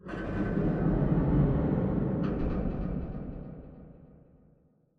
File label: Robot Arm Turn 90 Dist; Boomtown; Titanfall 2